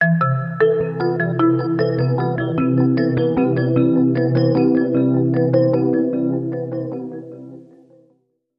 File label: Arp Sting